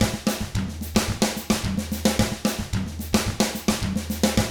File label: 110 SMBA samba; ILIO; Double Platinum Drums 1